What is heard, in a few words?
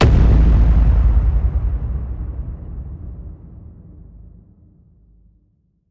hit big; Impair